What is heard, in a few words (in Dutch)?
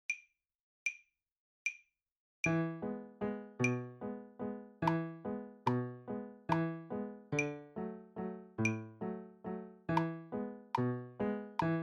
Sakura Piano langzaam E D